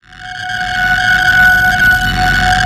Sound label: light ray charge up; dogma